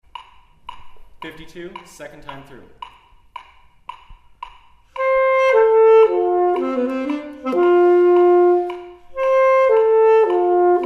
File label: Alto; Bayside